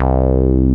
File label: wow bass; Masterbits; Dance Trax